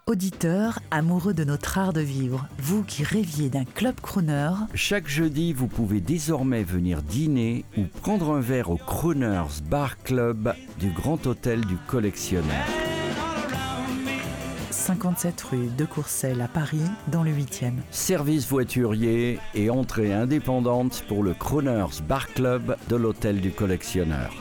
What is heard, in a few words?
GATE COLLECTION MUSIC RADIO BAR